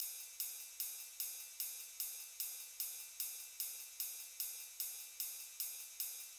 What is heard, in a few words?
Drums